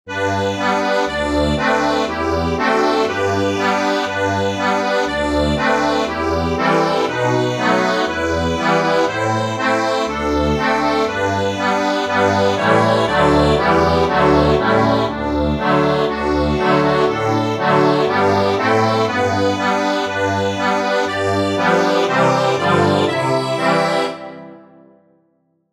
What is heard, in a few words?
accordion, sheet music